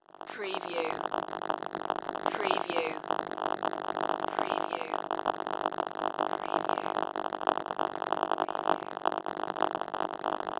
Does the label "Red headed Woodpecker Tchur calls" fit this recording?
No